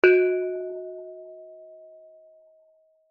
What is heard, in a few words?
musical instrument bonang gamelan hit soft